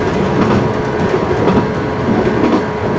Run, Train; OpenBVE

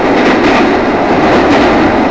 Run, Train; OpenBVE

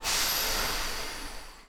air zero, Silverlink; OpenBVE; Cl313 Silverlink

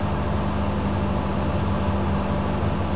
blowerloop, Train; APT; OpenBVE

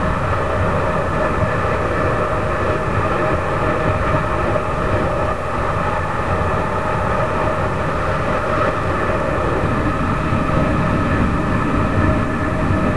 Run, class 66 train; OpenBVE

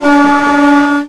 klaxon, Train; OpenBVE; Cl313 Silverlink

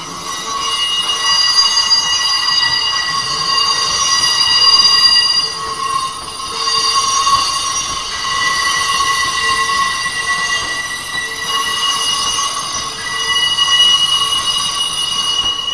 Flange, Train; OpenBVE

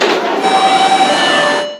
door open, train; OpenBVE; 2022 HQ R110B

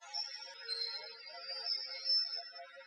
rub, train; OpenBVE

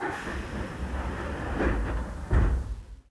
Door Open R, train; OpenBVE

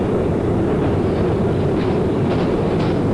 Run, Train; OpenBVE

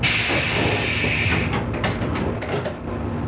Door Open, Train; OpenBVE

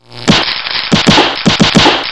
pan Arc; Cl323; OpenBVE

